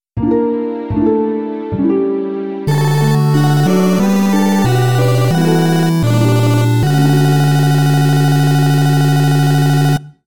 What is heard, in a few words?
jingle; chocobo